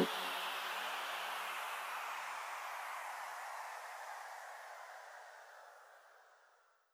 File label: downlifter, Drum Loop 128BPM; VEC5 Drumloops; VEC5 Multi Loops; Loop Elements